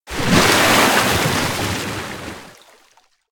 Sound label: creature breach; snowstalker